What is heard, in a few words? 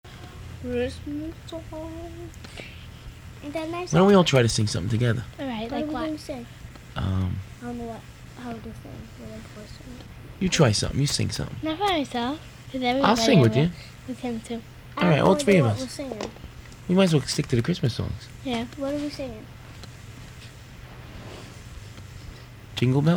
Jingle Bells